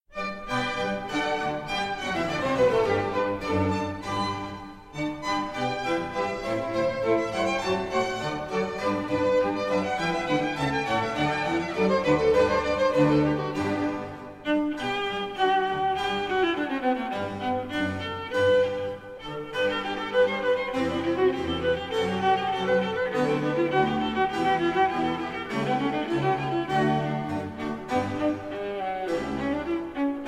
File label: Baroque Music for Studying Brain Power